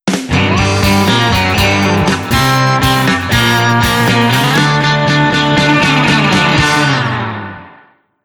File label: Sting Win (G)